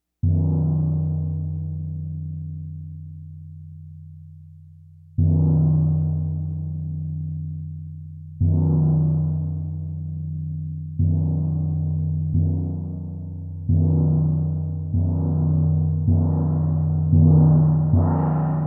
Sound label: gong